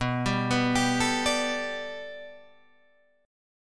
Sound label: B Minor 7th Sharp 5th B Guitar DADGAD, chord